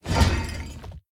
armor heavy; smite; god atlas

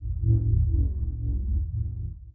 magic; smite; ahpuch skin10

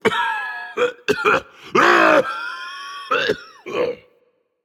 Clear Throat Dragon; fafnir

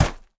footstep; Smite; god Kuzenbo v2

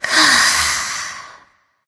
sigh; smite; jingwei

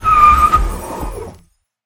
boar charge; smite; god artemis

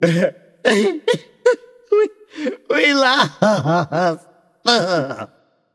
boohoo; Smite; baronsamedi

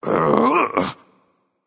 ugh, voicepack; smite; jorm

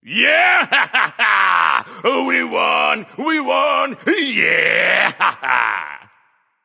yay; smite; voicepack; ravana

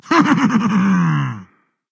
heh heh; Smite; cabrakan

